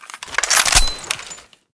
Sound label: misfire, weapons sks